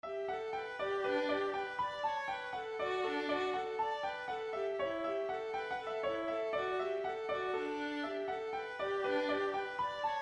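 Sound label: reel, part B melody and thirds harmony slow tempo; Reel des Vacances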